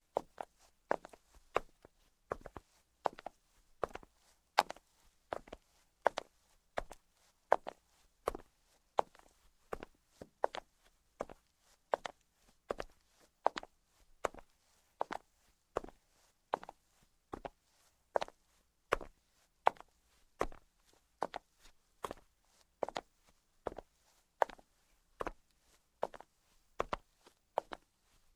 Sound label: Footsteps, Stone - Female; Sound Ideas 2000 Series